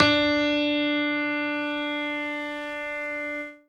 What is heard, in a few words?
piano melo